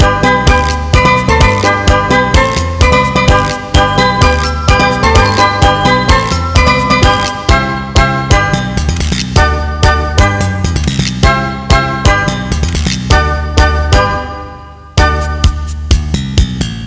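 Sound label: latin loop; avr loop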